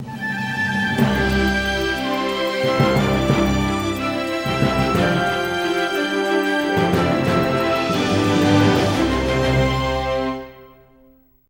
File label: sting lifetime achievement; The Sims 3; windows gamerip 2009